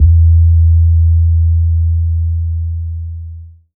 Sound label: BASS, Tekno Industrial; East West